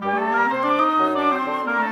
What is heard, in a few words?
Winds, Orchestral; Sonic Foundry (Sony Creative Software) - Orchestral VOL-2